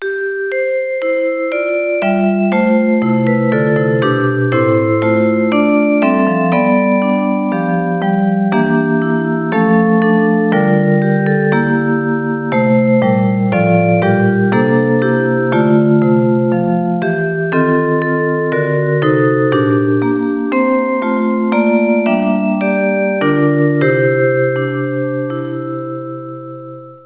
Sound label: bell; concert